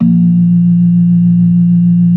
B3 A#2; Best Service; Advanced Media Trax Modern Composer; B3 LES 1

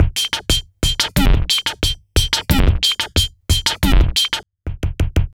squarespit 090bpm, Downbeat Dance Grooves; Zero-G

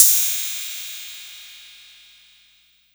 RIDE CYM 808; E-MU Formula 4000 Series Vol. 3; Analog Odyssey

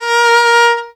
VIOL B3, VIOLON, Arabian Traditions; USB Soundscan vol.43; AKAI

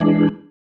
Stab One Shots, Organ; Sonic Foundry (Sony Creative Software); Joe Vitale Organ Donor

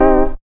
Old Hammond; Zero-G Jungle Warfare 1, 2 & 3

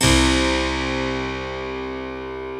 Harpsichord

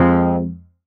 stab baap F, Noise; stabs

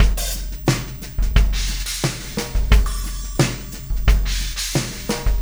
Beat, Loops

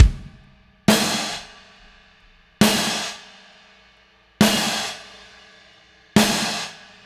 Drums, Indie Rock Anthems; Sonic Foundry (Sony Creative Software); Session 04 - Dubwyzz

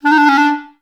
D3, orchestra; Best Service; Peter Siedlaczek's Advanced Orchestra

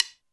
Md Sticks, Alesis D4 drum machine

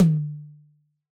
Yamaha DJX 2B, Jazz Tom

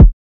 kick deeper

